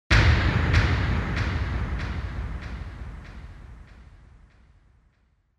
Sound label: Onoma-Impact (Low-Delay)